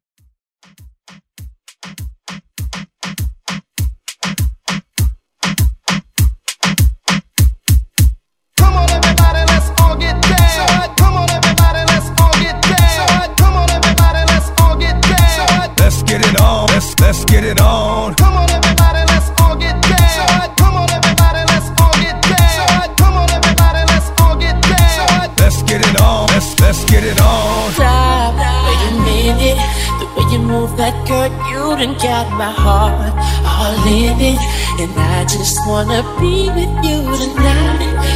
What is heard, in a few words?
Reggaeton